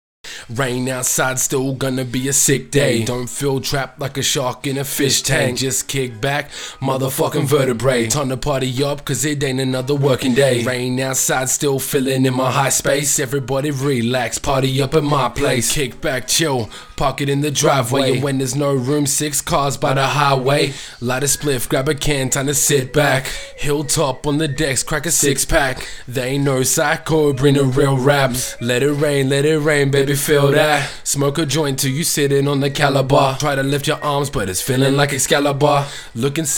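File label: acapella, HH 95 F sharp minor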